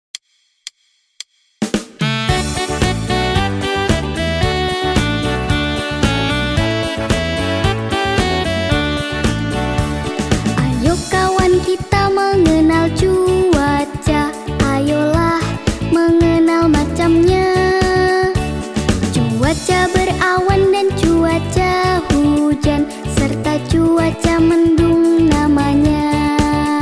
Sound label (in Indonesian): Lagu Mengenal Cuaca